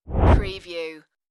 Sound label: Wipe